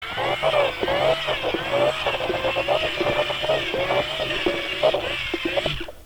mixer

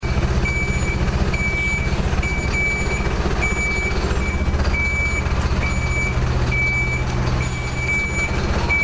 lkw faehrt rueckwaerts piepend

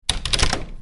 tuere schliessen

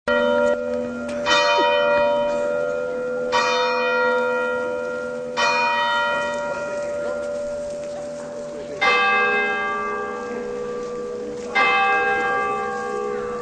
rathausturm schlaegt 4 uhr

